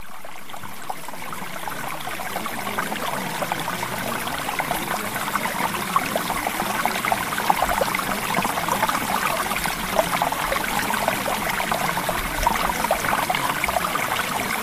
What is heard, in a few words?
Mountain Stream; Premium Subliminals